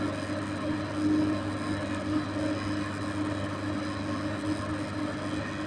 machine; 30 cc0 sfx loops

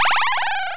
teleport

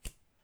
card shoot out

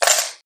keys, wood metal

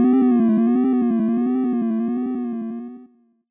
Retro Musicaly nananana

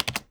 Keyboard - Key Combo

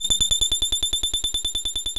error, Retro Sounds 32-Bit

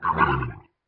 weird; creature

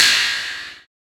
Buzz Step; FREE SFX PACK VOL 2

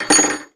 tools

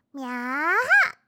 meow, Catgirl; Catgirl Fighting SFX

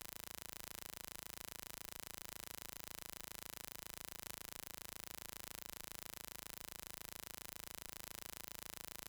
Electromagnetic Car Front Loop; Mono; Essentials Series NOX SOUND

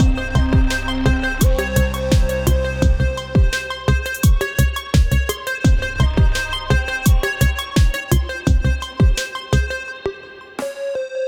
Stress; BB 2HTC Samples Vol 4

